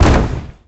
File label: Boxing Ring Bodyfall